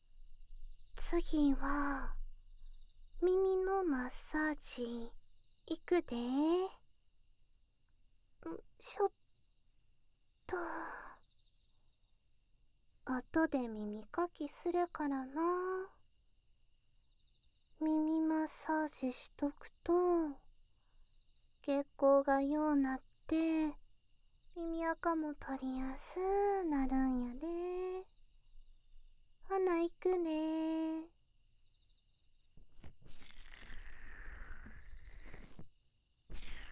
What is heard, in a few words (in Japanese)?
耳マッサージ; 耳かき旅館 猫屋敷 ～茶虎～